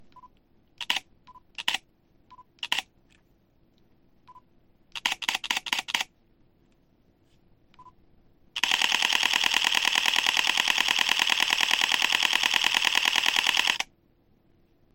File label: shutter sound